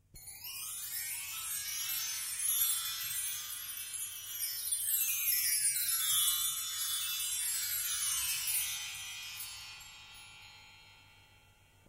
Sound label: Rain rain go away